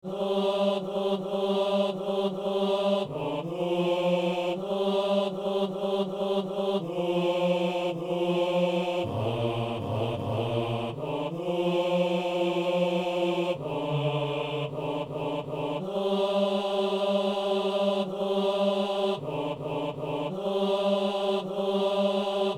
basse

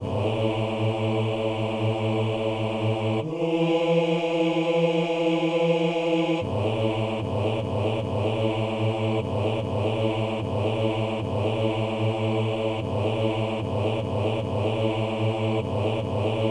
basse

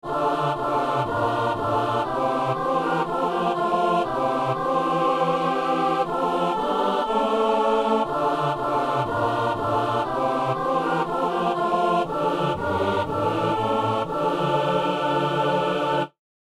messe alleluia; messe de saint jean

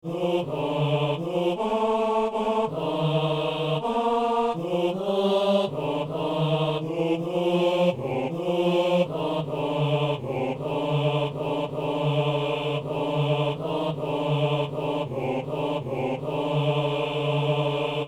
basse; Venez, divin Messie

